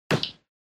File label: Motion Grab Low